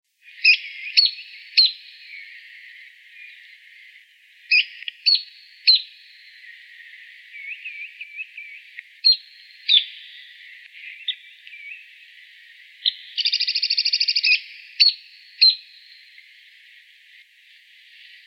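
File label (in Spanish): Espinero pecho manchado y suena Carpintero Bataraz Chico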